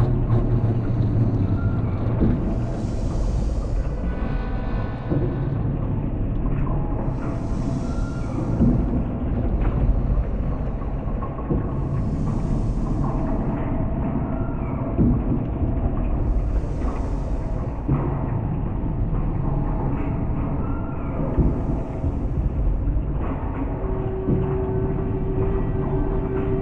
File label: Gears